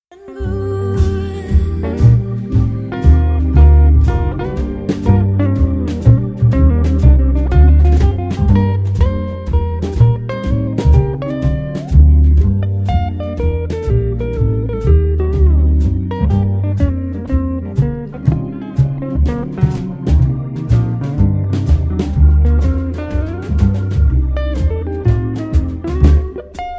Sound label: solo